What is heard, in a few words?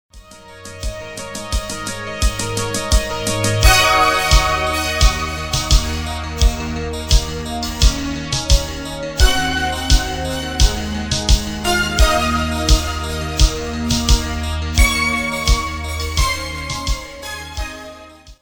Calypso